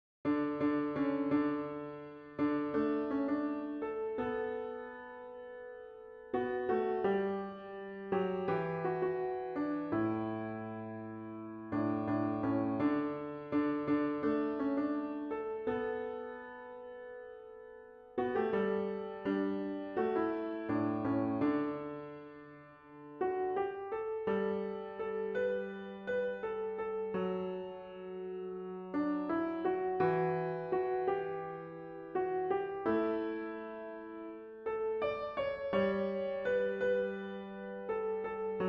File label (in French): CLAVIER; CelesteJerusalem